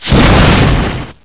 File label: flame end; Hexen II; eidolon